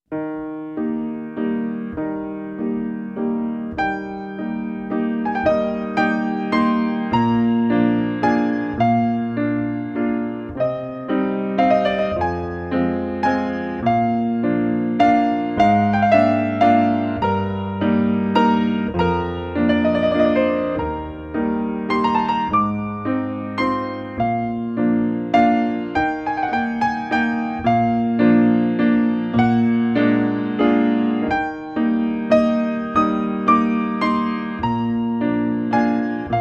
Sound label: Adage, intermediate ballet